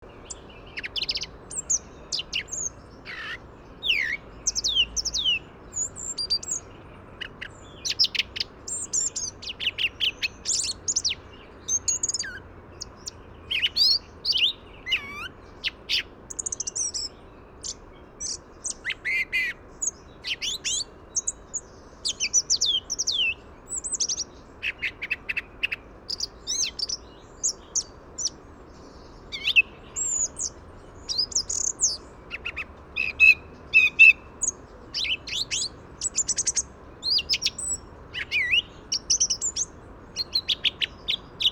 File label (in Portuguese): tordo-comum